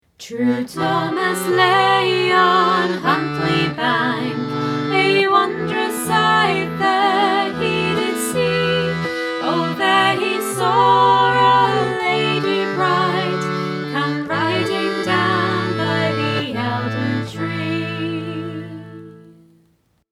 Thomas the Rhymer alternative chords and vocal harmony